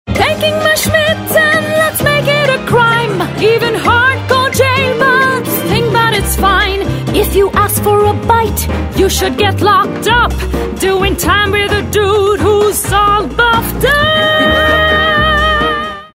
ad English song; Schmitten chocolate; Priyanka chopra

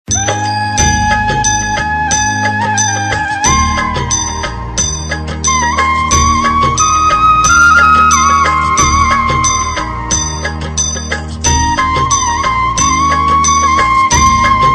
Flute; TV Serial Tone; 9x; Kahaani Hamaaray Mahaabhaarat Ki

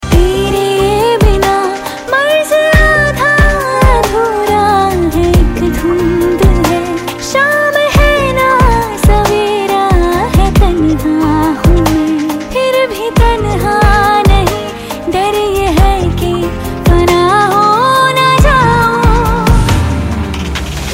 Female Version Ringtone; Bollywood; 1921

